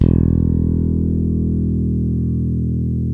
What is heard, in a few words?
JAZZ, JAZZ BASS